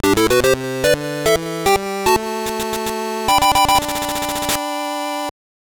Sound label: Jingle; Kuma no Puutarou Takara Sagashi da Ooiri Game Battle; SGB